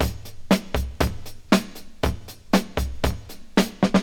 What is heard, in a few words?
119 bpm fresh breakbeat sample f sharp key